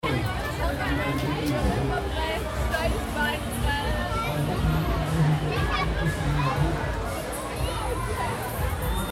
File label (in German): Rummel Menschen Gemurmel